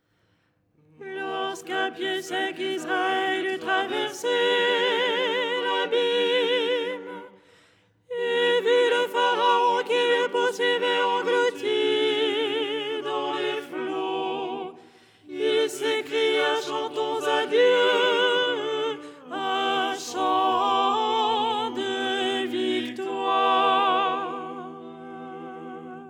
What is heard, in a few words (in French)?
soprano; 1er hirmos du canon du dimanche; Les 8 tons en français; ton 6